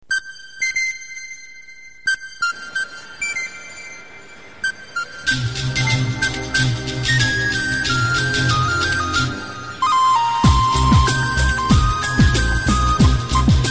Ringtone

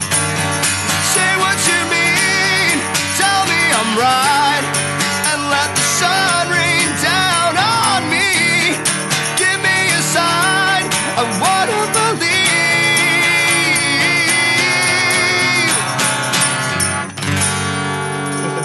acoustic

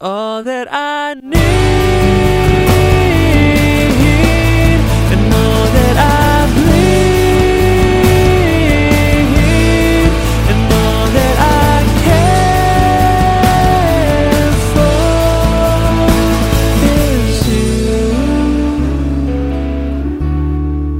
Waltz

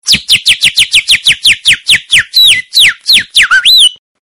Bird Squeeze

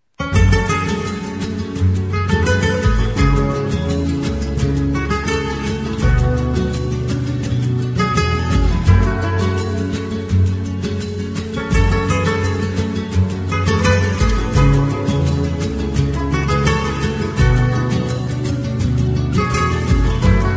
Romantic Guitar

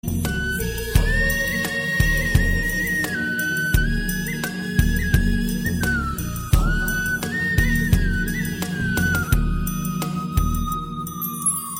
rhythm

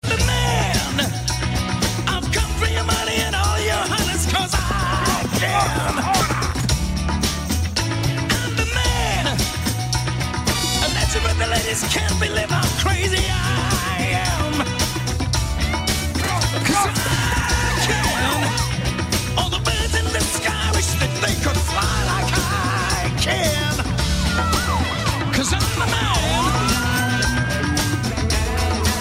Bajaj Pulsar 220

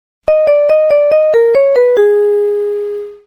Alert

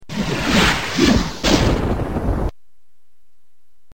Fireball; Doom

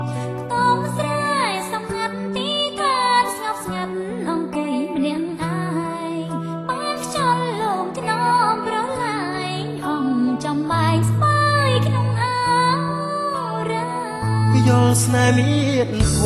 Khmer Song

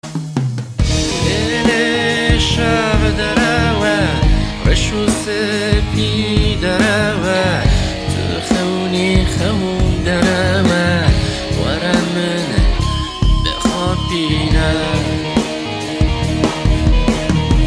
Jazz

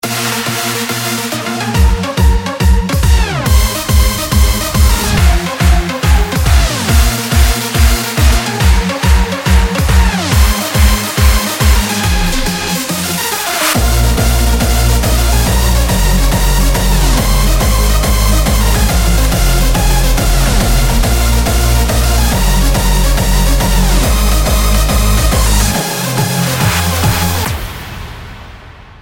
best hard electro

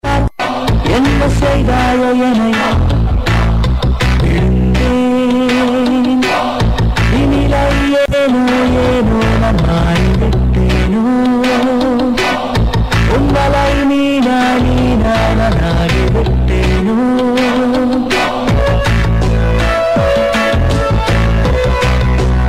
Love Blues

